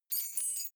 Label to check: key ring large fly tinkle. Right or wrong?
Right